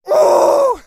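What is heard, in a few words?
sounds with the scream of a burning person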